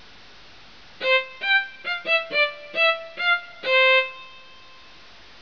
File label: VIOLIN